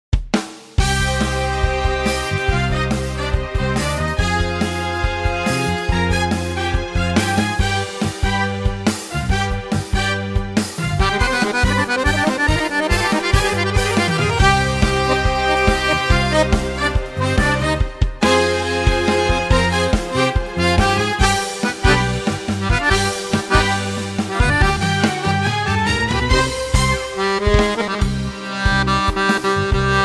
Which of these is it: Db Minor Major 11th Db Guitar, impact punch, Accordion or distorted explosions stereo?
Accordion